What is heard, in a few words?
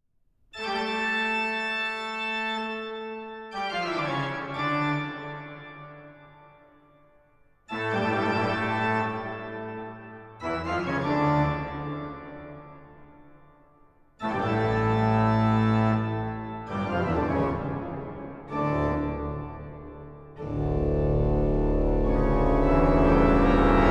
Toccata & Fugue in D minor, Organ